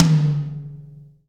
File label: drum hit clap